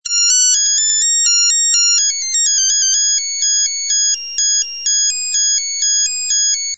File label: ringtone; burgertime